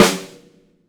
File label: SONOR, SONOR SNARE